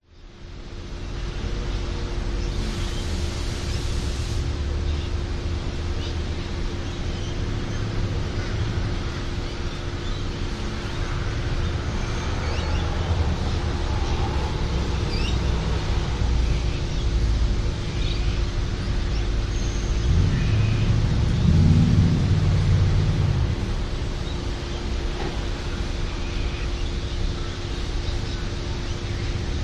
vehicles traffic outside front factory traffic trucks constant whir of extractor